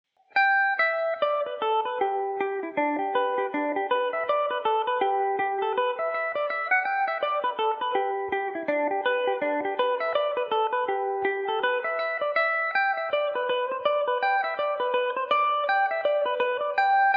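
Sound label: G major revelation, Irish mandolin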